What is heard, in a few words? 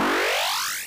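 power up sci-fi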